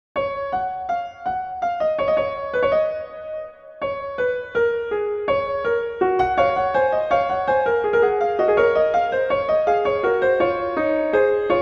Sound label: Fugue; Bach; Prelude Fugue XIII